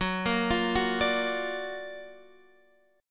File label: Gb Augmented Major 7th Guitar Standard; Gb+M7 arpeggiated; JGuitar